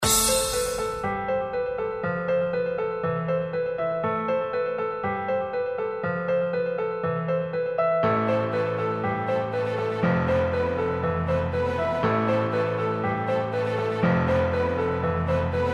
Dramatic Suspense Short Loop; FXGuru Movie FX Director

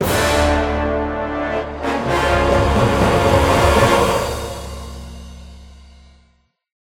Reinforcement Stinger; StormKing

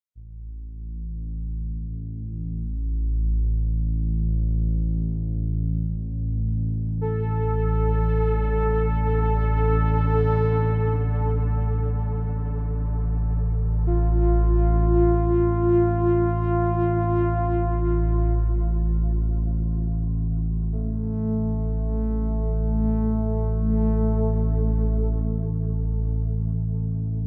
Day Stinger

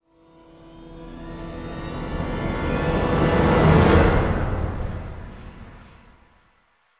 Wake Up; Police Station Cutscene

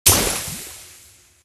Sulfur Alchemist Acid; Dwarves

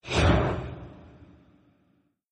demon die sound; Arc of Templar

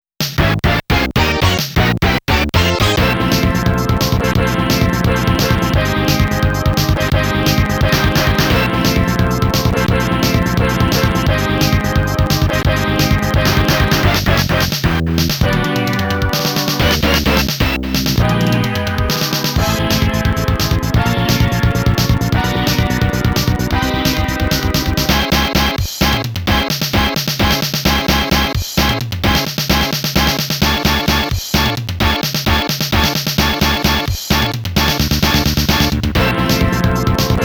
Boss Battle; Gunbird; Gunbird 2

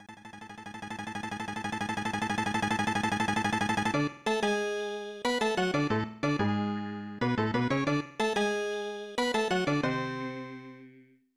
Piano Solo; Lurch's Piano Solo 6; The Addams Family; SNES

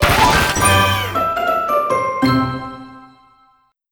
Crunchy Finish

